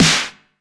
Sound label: Snare, Drum & Bass; AMG - Prototype Drum & Bass; Snares